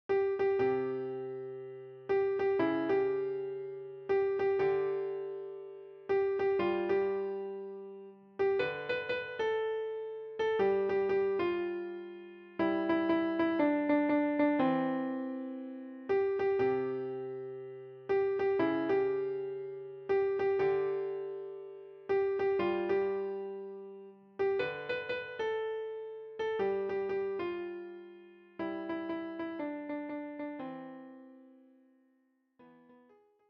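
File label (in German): Klavier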